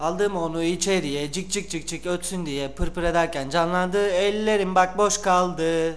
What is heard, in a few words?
Turkey; Interactive Media Design Review 1999